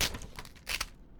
paper tear; Barotrauma; More Level Content

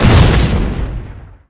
railgun heat explode; Weapons; EK modern; Barotrauma

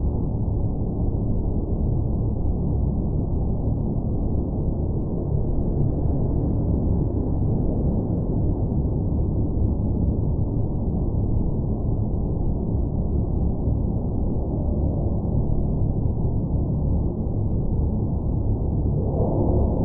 Hydrophone Ambience Cold Caverns; Soundproof Walls 2.0

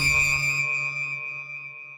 sonar Tail Water Very Close Beacon; Barotrauma; Real Sonar

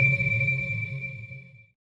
sonar Tail Water Medium; Barotrauma; Real Sonar

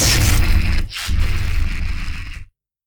die; Barotrauma; Fractalguardian

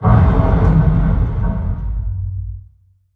Structure Blunt; Damage; Barotrauma; Room FX

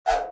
throwingknife; Weapons; EK modern; Barotrauma

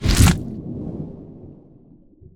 implode; Damage; Subsurface; Barotrauma

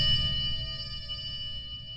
sonar Tail Water Medium Beacon; Barotrauma; Real Sonar

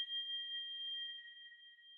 sonar Tail Water Far Beacon; Barotrauma; Real Sonar

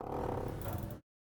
Eavesdropping Activation; Soundproof Walls 2.0

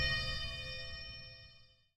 sonar Tail Water Medium Beacon Directional; Barotrauma; Real Sonar

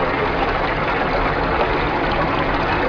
homing shell water; Weapons; EK modern; Barotrauma

